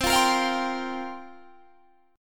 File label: F Suspended 2nd Suspended 4th C